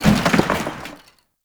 Janitor Cart Impact; Warframe; Ambience; NineteenNinetyNine

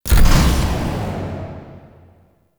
UI Mod Deactivate Generic; Warframe; Railjack; Player Ship

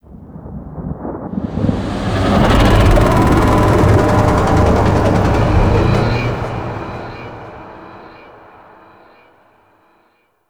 Void Dragon Vocal Distant Idle; Enemies; Vocals; Warframe; Duviri

